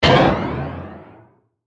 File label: Power Up Button; Burst, Ascend, Accelerate, Production, Element, Imaging, Accent, Transition; BlastWave